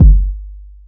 rich lows steel kick drum g key, Royality free steel kick drum sample tuned to the G note.; kick drum samples